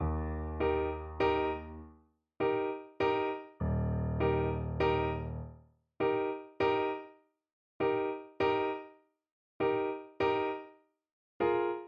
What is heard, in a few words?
Double Bass